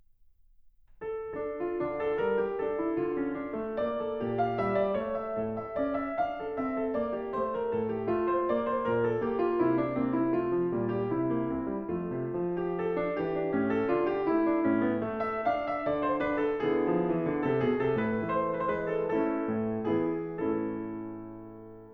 Suite in D minor Courante, Piano Sonatas and Suites; Suite No. 4